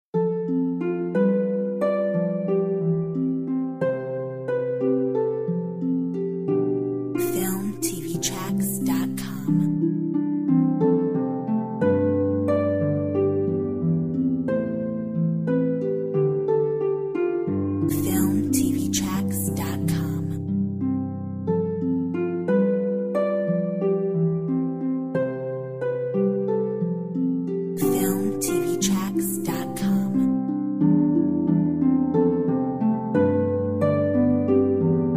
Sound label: Hush a bye Harp